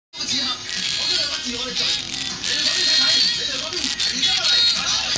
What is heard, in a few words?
noise HM-802